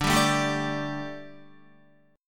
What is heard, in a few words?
D Suspended 4th D down Guitar; strummed